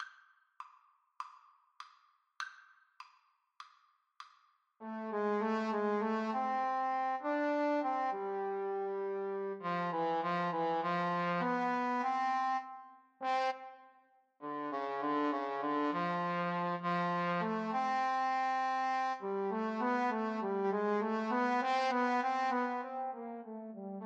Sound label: Trombone Duet